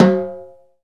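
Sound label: Timbales hand; E-MU Producer Series Vol. 2 – More Studio Essentials; Composer; Timbales Xswitch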